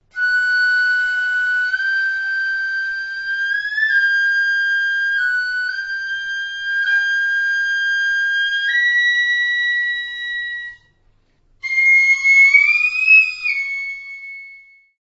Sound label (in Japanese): 高麗笛